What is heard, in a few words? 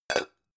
pan close chink soft